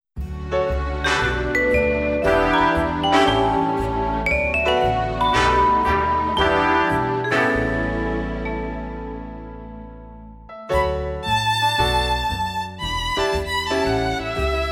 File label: Jazz Ballad